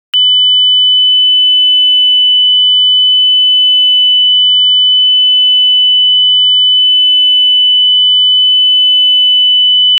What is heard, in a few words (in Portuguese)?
efeito sonoro de alarme, evacuation